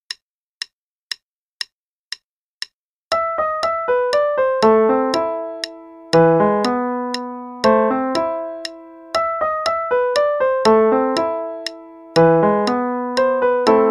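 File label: Play-a-long, easy, quarter note=120; Beethoven; Fur Elise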